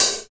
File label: HAT 2; East West - Phat & Phunky CD2; 90's Sample CDs